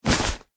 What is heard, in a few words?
luggage bag rucksack set down carpet; zapsplat